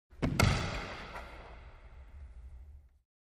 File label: Body Slamming Into Racquetball Court Wall